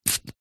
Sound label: household perfume spray single; zapsplat